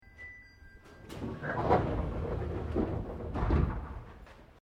Train JR Regional Aomori Local Door Exterior Open, Japanese Trains; Vehicles